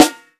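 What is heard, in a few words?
club Snare; Roland SPD-S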